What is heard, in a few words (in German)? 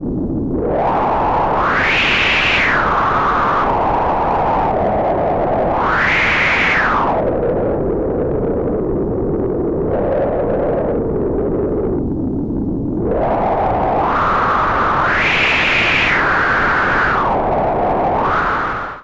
SH Noise Wuestenwind; GRP Module